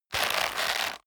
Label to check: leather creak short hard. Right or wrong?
Right